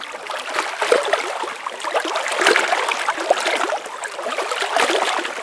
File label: waves; Mafie 1